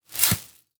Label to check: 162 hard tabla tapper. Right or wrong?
Wrong